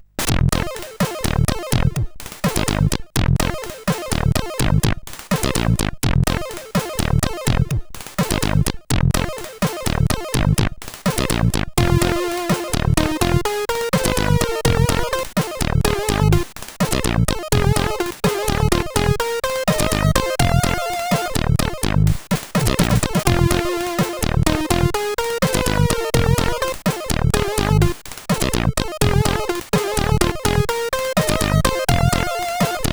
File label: Jazz, sid CSG8580R5